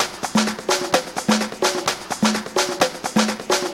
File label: Percussive Loops 128BPM; Vengeance Samples